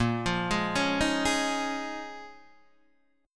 Bb Minor 11th Bb down Guitar Open D

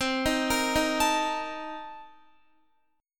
C Minor 13th C Guitar; Cm13 arpeggiated; JGuitar

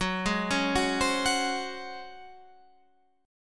C sharp Major 7th Suspended 4th F sharp

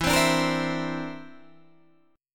D 7th Suspended 2nd Sharp 5th E

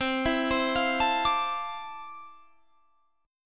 F Major 13th C, guitar chord

